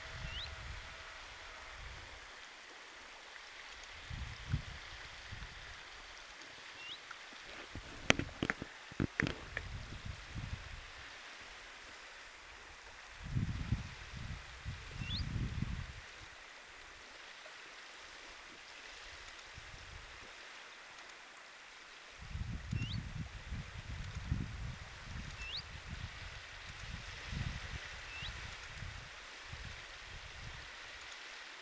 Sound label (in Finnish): lintu aantelee